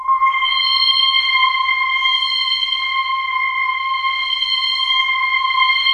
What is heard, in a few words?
wake up pad; WAKE UP LYR; Masterbits - Tekkno Trax; SYNTHFX+PADS